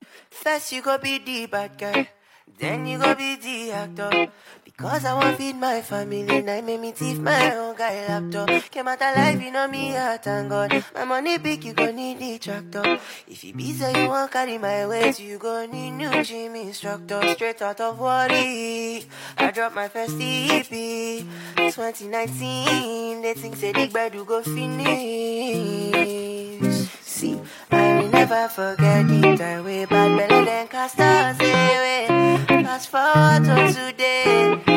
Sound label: Acoustic Version